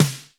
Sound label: FAT, R8 DRUMS; Crypton - DrumBase5000